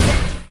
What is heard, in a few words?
powerups drone deactivate; spider-man-mysterio-rush-game